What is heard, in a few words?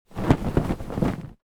object flag medium flap; smartsoundfx